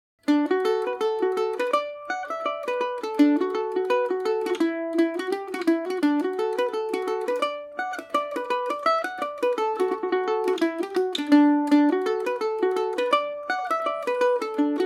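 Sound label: D major, Irish mandolin; devanneys goat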